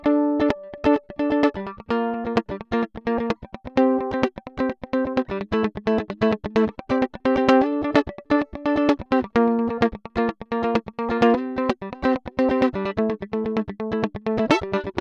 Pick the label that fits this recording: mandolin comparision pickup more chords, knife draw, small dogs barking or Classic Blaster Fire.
mandolin comparision pickup more chords